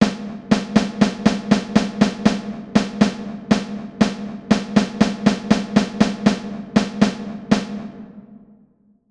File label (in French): Main gauche 60bpm